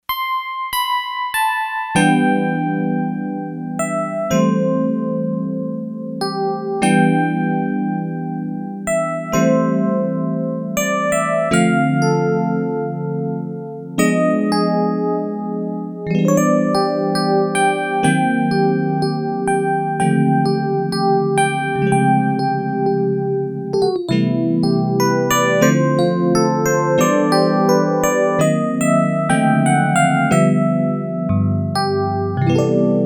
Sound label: Full Tines, Yamaha TX802; Factory Patches; Voices